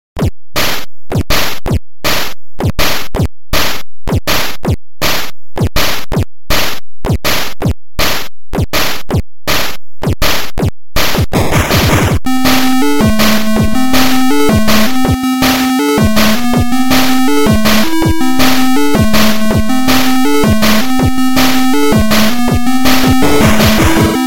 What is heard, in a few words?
drum and fast; weeklybeats 2012